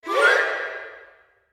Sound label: short run up